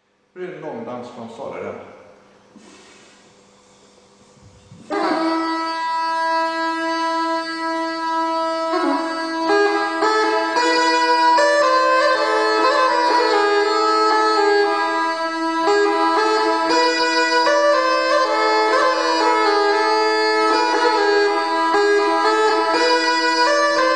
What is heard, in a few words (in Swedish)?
Långdans från Sollerön Swedish bagpipes